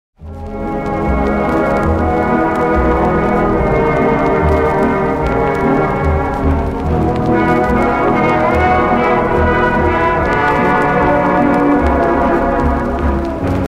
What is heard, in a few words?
sad troll song